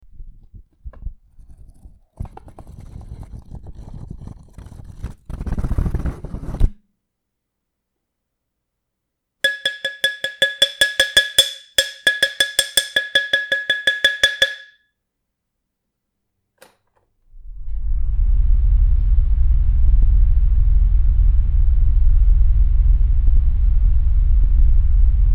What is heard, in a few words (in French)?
six bruits distincts; clef de sol